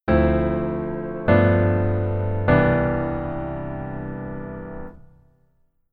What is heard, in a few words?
Keyboard Voicing Basic